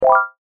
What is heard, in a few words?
Compound w Low Pass Filter; phet io wrapper sonification; molarity